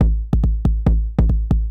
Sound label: KICK TECHNO 140, Techno Drum; Techno2